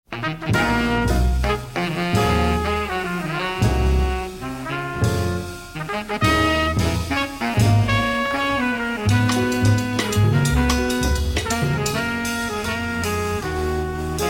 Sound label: Horn Salute